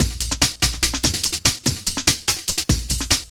Jungle, Drums & Percussion, Electronica; Sonic Foundry (Sony Creative Software); Electronic Point Blank

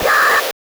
Drums; Distored Reality

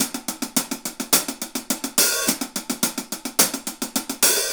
Hi Hat Pattern; Essential Percussion; Hi Hat Patterns

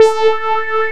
NOISE

